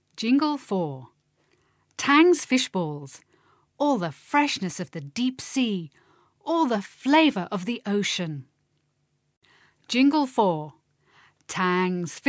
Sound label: Jingle; poems and songs